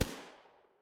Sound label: impact gunshot